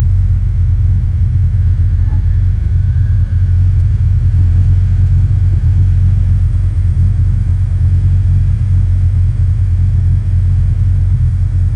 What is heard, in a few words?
honda civic exhaust sound; automobile